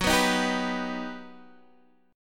G Augmented Major 7th

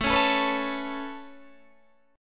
A Major Add 9th A Guitar DADGAD, chord; 19,16,19,16,16,19; Aadd9 strummed

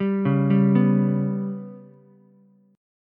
G Major Flat 5th Ukulele, chord; GMb5 arpeggiated; JGuitar

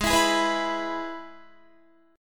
Minor Sharp 5th, Guitar; G#m#5; strummed; 16,19,18,16,16,18; 4E,4A,5D,5G,5C,6F

